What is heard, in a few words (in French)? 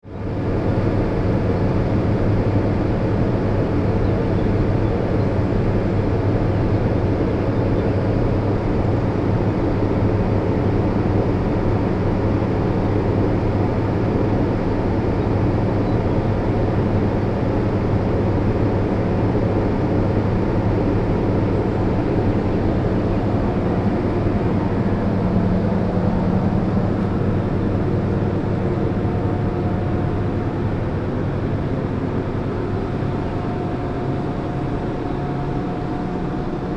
turbine